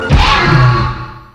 Velociraptor

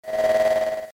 teleport; Cavestory

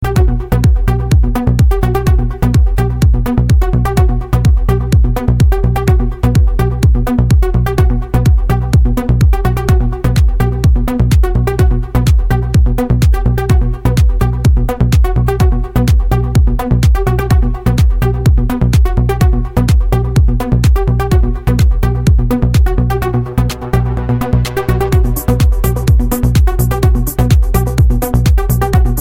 Progressive

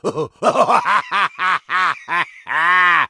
Troll Laugh

